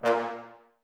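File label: Brass trombones A#; Miroslav Vitous - Woodwind Brass Ensemble; Tbs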